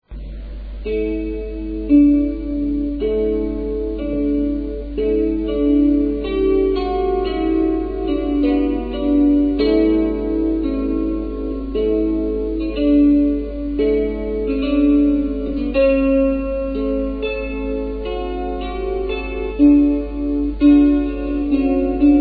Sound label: 10 string exerpt